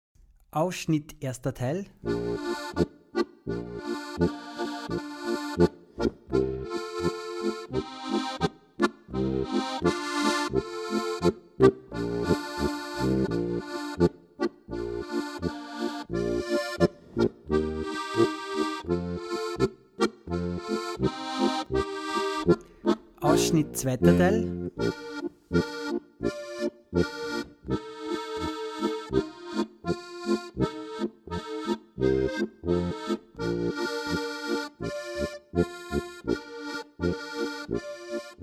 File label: Polka; Steirische Harmonika